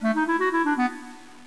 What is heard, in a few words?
enter; practice